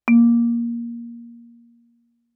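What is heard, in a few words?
Marimba yarn fortissimo Bb2; MIS Pitches - 2014; Percussion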